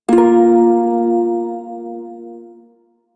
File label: Prime Shawzin Chromatic Chord K; Music; Warframe